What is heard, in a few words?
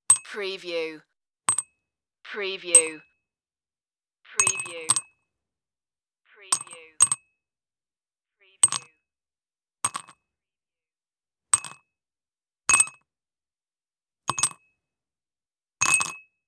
drop sugar cube into cup